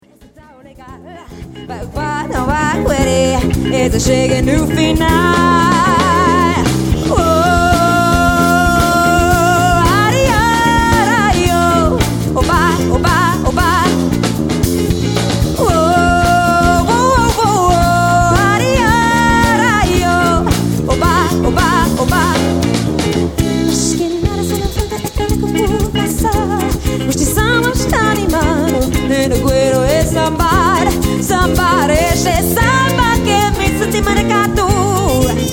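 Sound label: Quintet (vocals, guitar, keys, bass, drums)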